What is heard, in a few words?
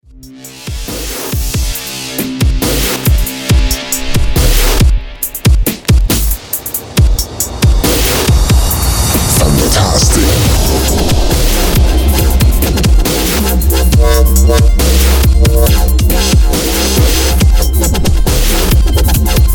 Fantastic Wobble